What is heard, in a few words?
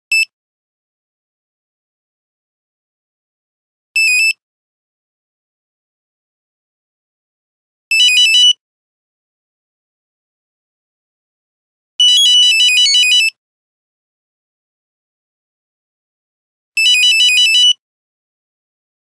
Nokia Buzzer; Windows Phone apps